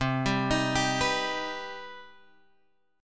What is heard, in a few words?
C Minor Major 7th, Guitar, Drop D; down; chord shape